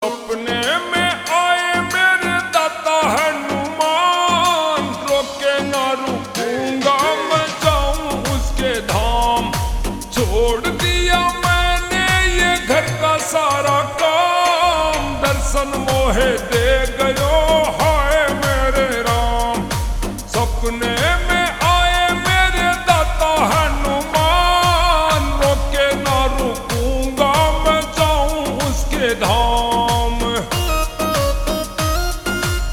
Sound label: Ringtone Slowed Reverb